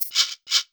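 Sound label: shaker, Ragga & Dub Reggae; USB Soundscan vol.27; AKAI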